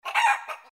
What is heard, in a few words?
chicken, Farm